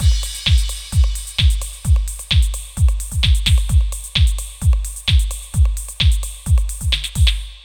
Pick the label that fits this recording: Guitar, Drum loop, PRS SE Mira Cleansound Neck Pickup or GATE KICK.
Drum loop